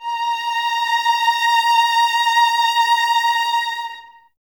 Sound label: A#5, 11 Violins, Soft; Miroslav Vitous